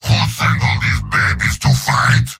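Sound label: yell, robot; heavy; mvm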